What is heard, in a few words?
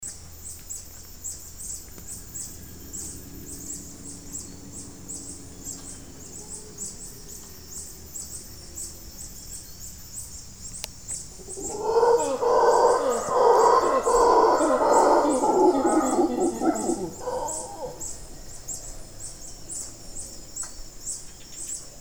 Mantled Howler Panama